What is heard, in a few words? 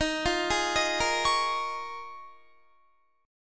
Eb 7th Suspended 2nd Suspended 4th Eb, Guitar; Eb7sus2sus4; arpeggiated